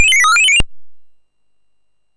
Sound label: sid MOS6581R4; Frogger II-Three Deep